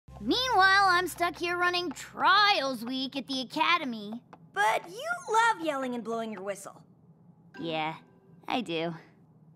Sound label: yelling and blowing her whistle; Spitfire